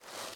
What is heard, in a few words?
Sittable Office Chair Stand